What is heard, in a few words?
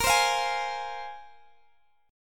A sharp 7th Suspended 2nd down Guitar DADGAD